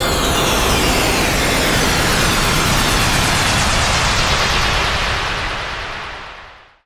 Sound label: TECHNO FX 140